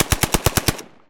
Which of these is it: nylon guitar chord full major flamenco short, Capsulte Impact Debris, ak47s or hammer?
ak47s